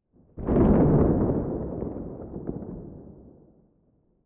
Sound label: Deep and Far Explosion; SoundsCrate